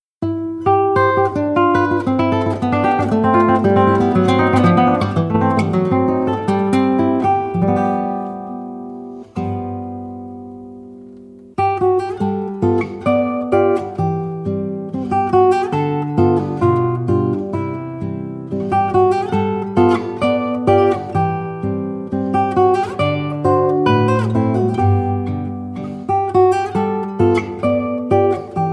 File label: Gavotte